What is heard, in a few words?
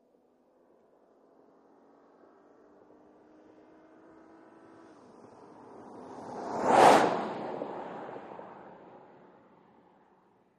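SUV By From 100mph Left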